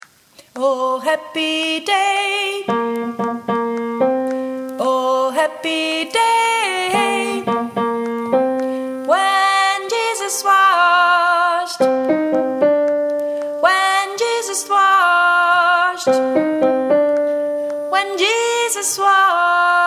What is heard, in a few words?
soprano